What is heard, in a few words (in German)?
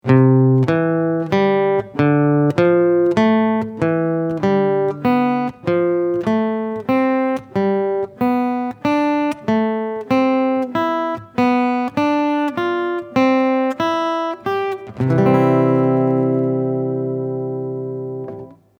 dreiklänge